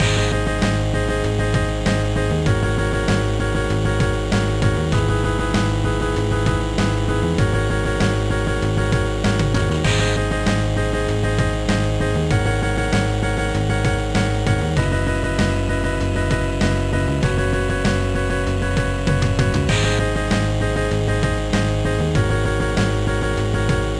BGM; Flushed Away; GBA

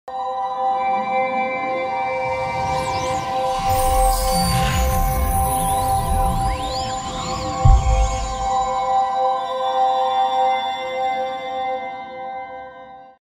Cinematic Music; Shroud

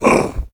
Troll Hit; The Battle for Wesnoth

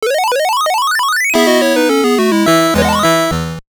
Jingle; Kosodate Quiz Dokodemo My Angel; WonderSwan

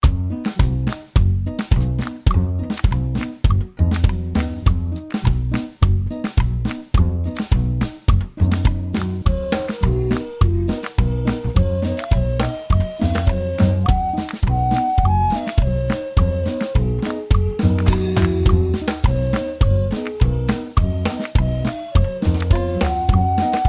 whistle dance; Shadow of the Game

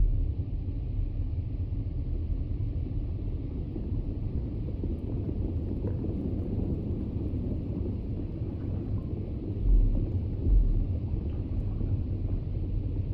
underwater; Kick & Fennick

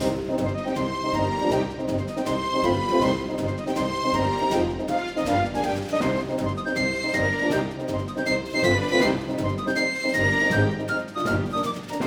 Combat Loop; Music Shipwreckbeach; Curse of the Sea Rats

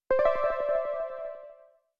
Vocabulary Gain

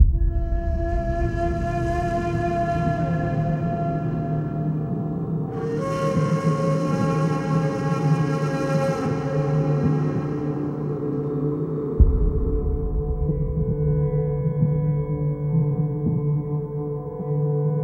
Exploration; Damnation

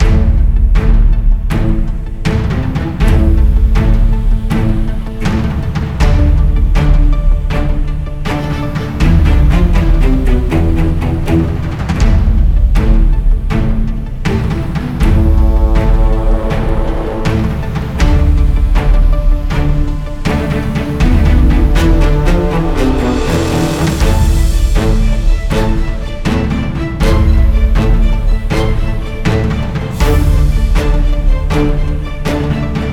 COMBAT TENSION

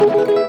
Mariachi Guitar Solo Short; gamerip; WrestleQuest

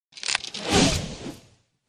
Archer Skill

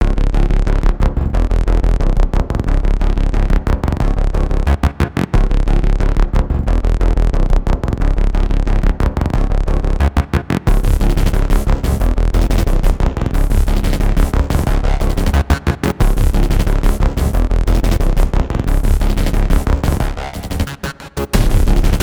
Dramatic Background Theme

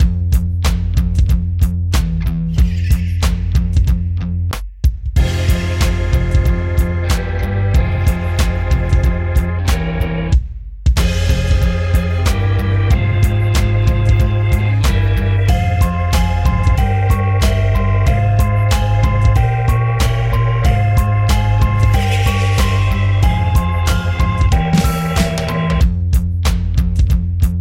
Lobby

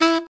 Notes E Sax; Scratch game maker software

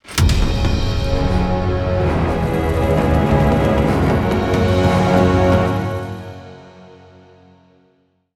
Stinger Boss Death; skykeepers; ps4 windows xbox one gamerip 2017

